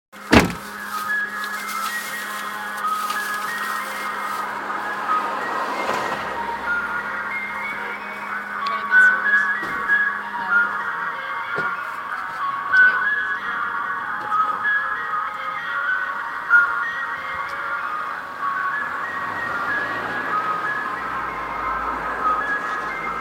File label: ice cream truck propane